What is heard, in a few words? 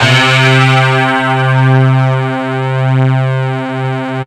Bowed Synth Strings; instrumente